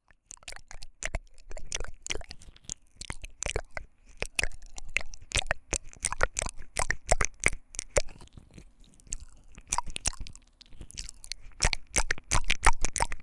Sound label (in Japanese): ぴちゃぴちゃ水っぽい耳舐め, ASMR